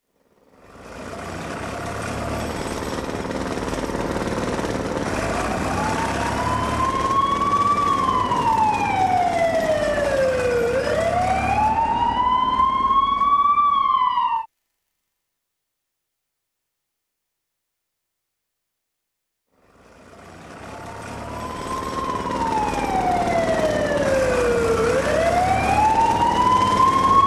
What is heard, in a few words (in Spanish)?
Ambulancias